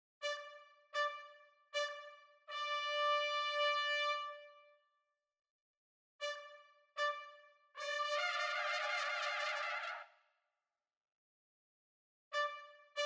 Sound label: Swing Trumpet Section